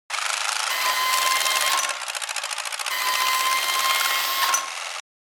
RC excavator toy digging; rc toys

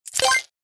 Slot machine credit insert game play

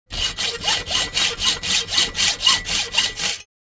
Handsaw cutting iron

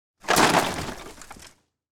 Scooter falling over clatter and crash

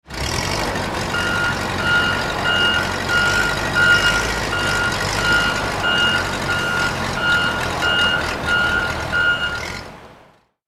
Bulldozer movement backup beeper

